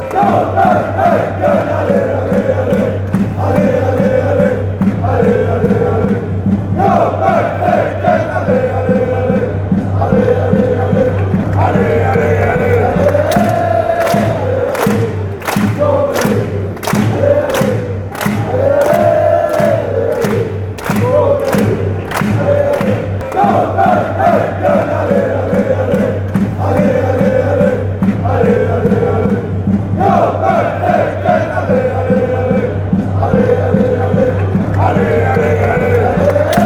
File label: allez allez allez, fanchants; copenhagen